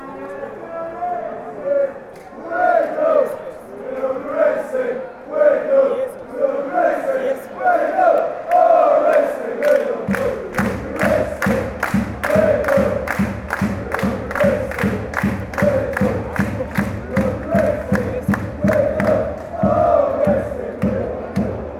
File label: racing wake up, fanchants